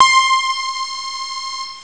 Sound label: metall chip; Amiga Soundtracker Sample Packs